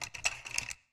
slide out; War Thunder

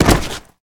bodyfall stone

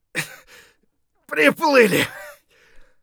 hurt near death; Escape from Tarkov

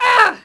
Archer Attk Vox; Civ4 warlords

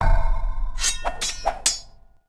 Build Swordsman; Civ4 warlords

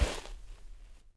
Animal Foot Large Snow; Civ4 warlords

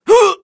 Elder Exert; english; voiceovers

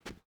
footstep rubber boots cloth jump start; Rust

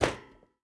footstep barefoot metal jump land; Rust

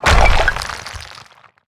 objects, World of Tanks

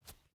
footstep boots concrete jump start; Rust

